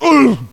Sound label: man hit; Mount&Blade Warband